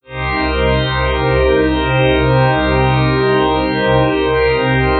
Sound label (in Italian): Rumore armonico